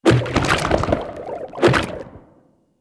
heal

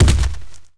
ape walk; Seer; GamigoNA

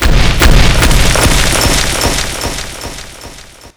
die

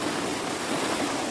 Snow Board Run

